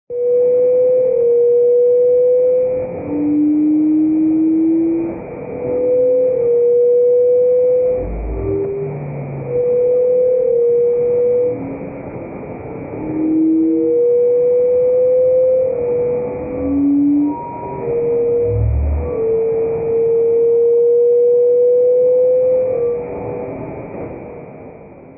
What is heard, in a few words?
whales